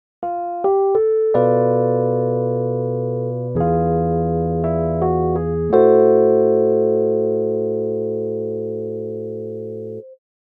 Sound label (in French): bm7b5 e7b9 am6 melodie; jazz; Conflit entre la deuxième phrase de la mélodie et l’harmonie